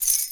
sistrum; Kenya

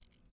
thai ching tone; Thailand

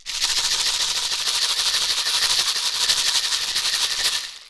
box rattle; Kenya

